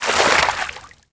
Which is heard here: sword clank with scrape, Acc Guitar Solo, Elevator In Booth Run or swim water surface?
swim water surface